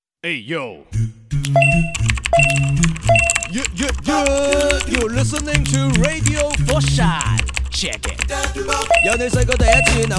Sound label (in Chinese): 粤语说唱; 粤语说唱样